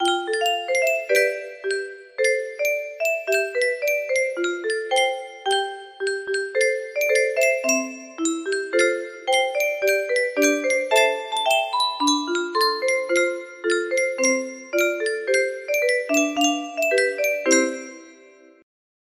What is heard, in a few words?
melody, music box